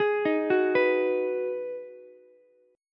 Ab Minor 7th down Ukulele; arpeggiated; JGuitar

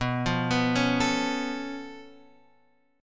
Db Augmented 7th B

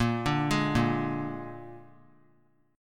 Bb 7th Bb down 3C,3F,3A,3D; arpeggiated; JGuitar

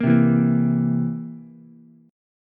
A sharp Suspended 2nd Flat 5th, down, Ukulele; strummed; A#sus2b5; JGuitar

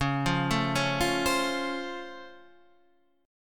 Db Augmented Major 7th, down, Guitar, Standard; arpeggiated; JGuitar

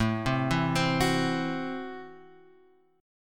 A Minor 6th A; Am6 Chord {5 3 2 5 X 2} arpeggiated

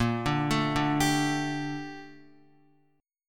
Bb Major 13th Bb down Guitar; 4F,4A,5C,5G,5C,6E; arpeggiated

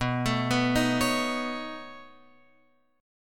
B 9th B down Guitar Open F; B9 arpeggiated; JGuitar

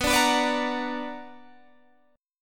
B Minor Major 7th, Guitar, Open G

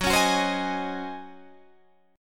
Eb Minor 13th Gb

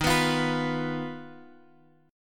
Eb Suspended 2nd Sharp 5th, Guitar, Open F; Ebsus2#5 strummed; JGuitar

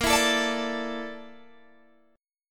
A sharp Major 7th Flat 5th, Guitar Open G

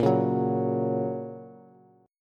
A sharp Minor Sharp 5th, down, Bass

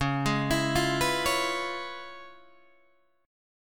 Db 7th Sharp 9th Db down Guitar Open D

